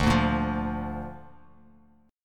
D Minor Sharp 5th D down Bass Standard; Dm#5 strummed; JGuitar

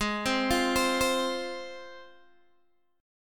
Ab 6th Ab, Guitar, Open G

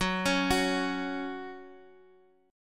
Gb 5th; 4G,5C,5E,5A; chordshape; down

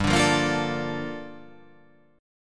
G Minor 9th; chordshape; down

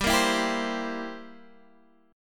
C 7th G

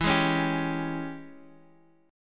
G sharp Major 7th Suspended 4th Sharp 5th, E

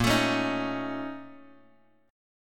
A sharp Minor Major 11th, down, Bass Standard; strummed; JGuitar

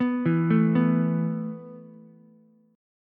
E Suspended 4th E, down, Ukulele; Esus4 arpeggiated

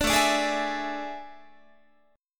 A Major 7th Suspended 4th, Guitar

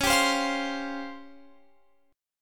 C sharp Major 7th Flat 5th Guitar Open F; C#M7b5; strummed

